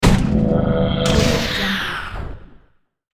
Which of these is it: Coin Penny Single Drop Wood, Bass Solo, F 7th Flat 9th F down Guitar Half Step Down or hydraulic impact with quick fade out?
hydraulic impact with quick fade out